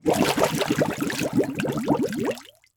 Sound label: Liquid Water Water Blow Bubbles Into Water